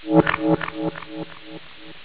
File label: level end; outpost